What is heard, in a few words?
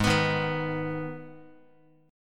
Ab Minor Ab down Guitar; Abm strummed; JGuitar